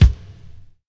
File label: phat kickdrum sound C key, kickdrum sound tuned to the C note